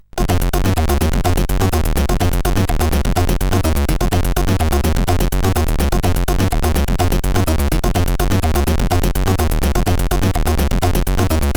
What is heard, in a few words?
Hard Break, CSG8580R5